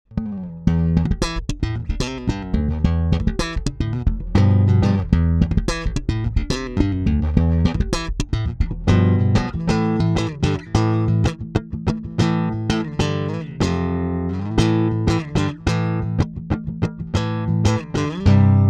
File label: active boost slap